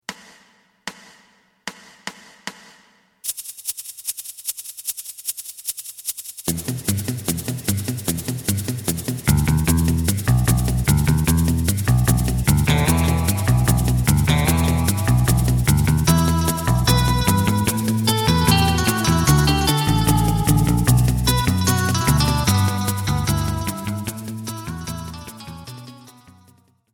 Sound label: drum; エレキバンド用カラオケ